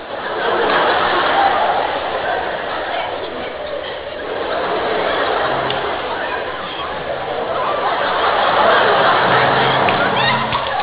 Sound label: laughing